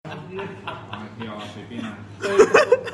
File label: laugh